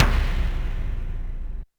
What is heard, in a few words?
Yamaha RM 50 Toms